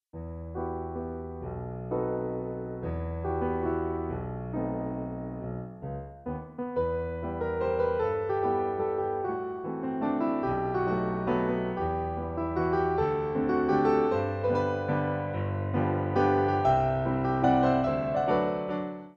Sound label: old russian waltz